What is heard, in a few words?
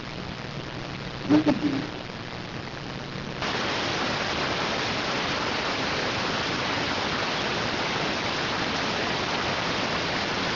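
radioteletype receive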